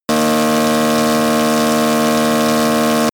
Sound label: snowmobile engine medium speed steady